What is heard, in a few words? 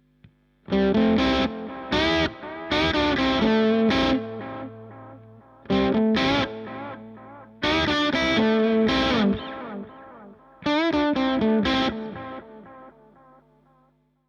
bogner delay analog; bogner alchemist